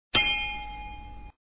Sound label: Sfx Bell; pencak silat 2002 gamerip online